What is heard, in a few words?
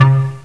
Bonus; Soldiers 2